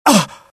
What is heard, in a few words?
death, pain; mohaa; dialogue